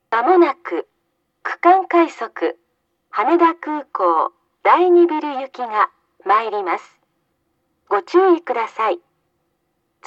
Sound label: oi-keibajo-mae section rapid haneda airport terminal 2, tokyo monorail station